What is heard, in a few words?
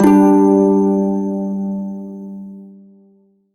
Prime Shawzin Chromatic Chord, Music; Warframe